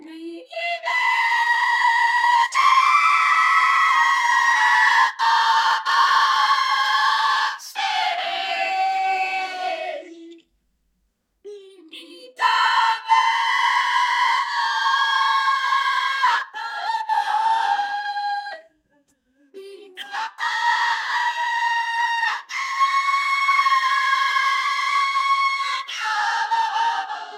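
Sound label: a cappella emo scream; acapella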